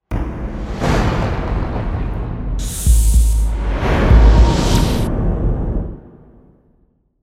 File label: taiko steam